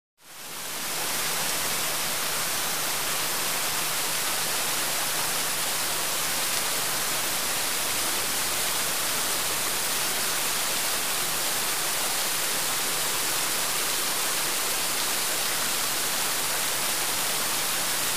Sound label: Fountain In Park, Very Close Perspective. Constant Water Rush; High End Noise With Lower Gurgles. No Background Sounds.